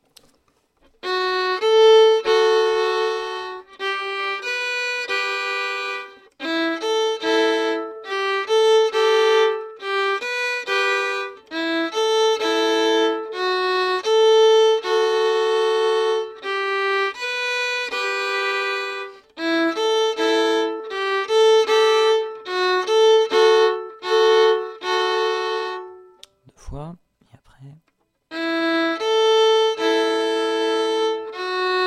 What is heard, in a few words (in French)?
Anglaise - decomposition double cordes, violon